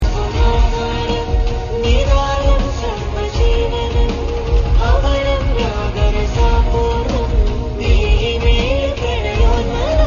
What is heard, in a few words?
song Ringtone